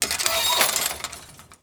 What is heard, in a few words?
Frog Cage; The Princess and the Frog; wii windows